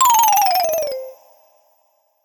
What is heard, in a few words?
Lose Bonus Tokens